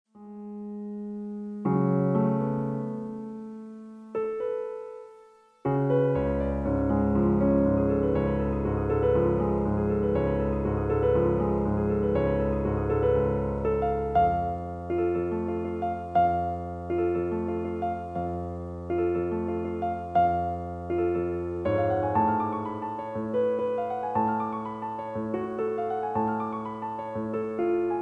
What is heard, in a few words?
Piano